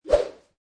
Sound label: Rope Swish Or Arm Swish, Big whoosh or swish sound; object swooshing through air like a sword swish, or any fast movi; Rope swish or arm swish 02: Big whoosh or swish sound, object swooshing through air like a sword swish, or any fast movi; Combat Swing